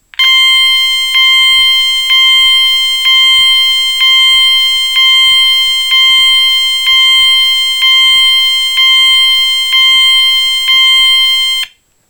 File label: Chime